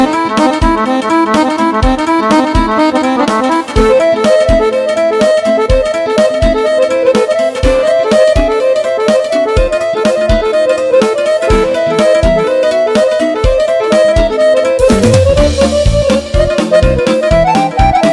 Korg sarba

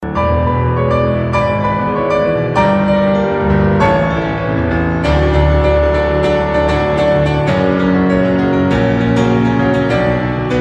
Piano

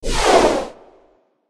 rocket launch, sms; ringtones

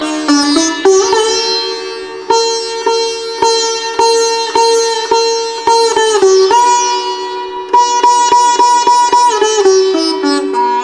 sitar sound; classical

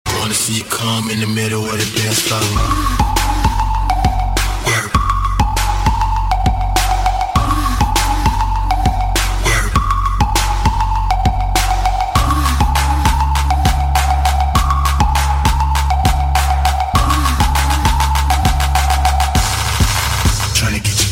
trap mix

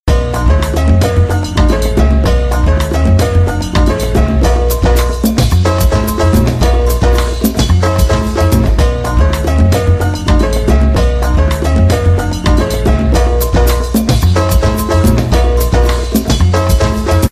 Latin Dance

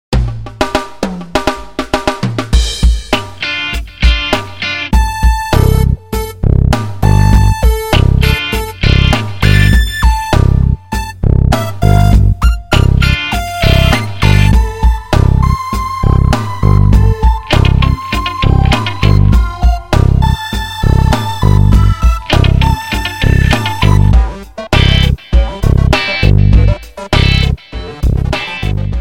Rock Reggae Ringtone

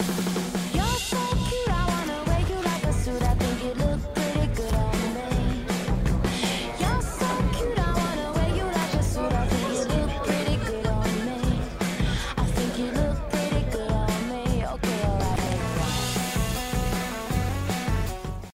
Boom Bap Pow

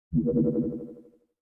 Scifi computer sound

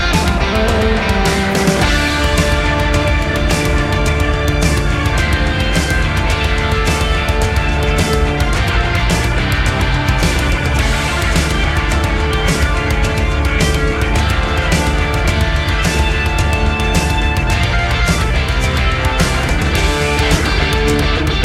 Hammond solo

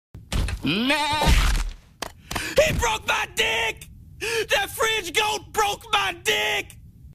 Fridge Goat

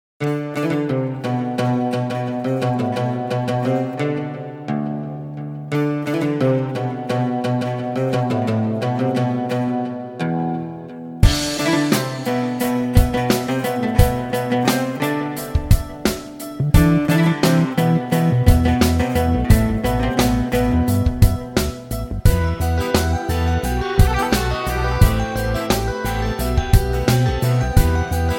oud hindi

